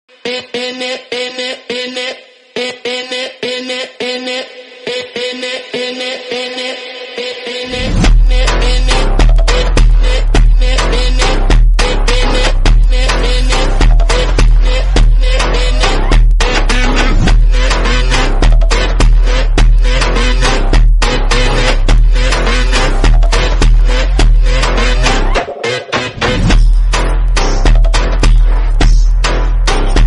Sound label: phonk trending ringtone brazilian bgm; phonk mano; viral insta